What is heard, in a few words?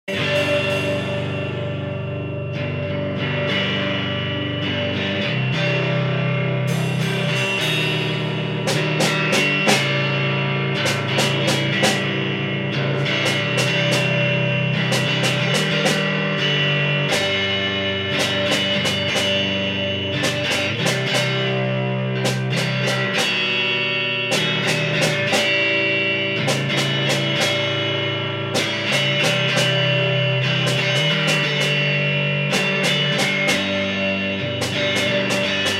Snare On G